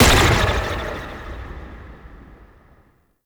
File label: Hair Stomp Burst; Warframe; Monkey King